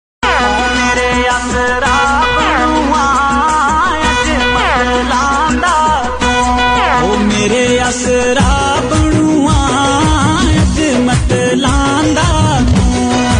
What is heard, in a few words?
Ringtone; himachali